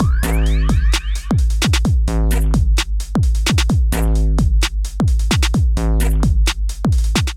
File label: Kit Melodic 130 bpm, Drums, Complex; MIDI Clips; BayTonal Kit; Ableton; Factory Packs; Skitter and Step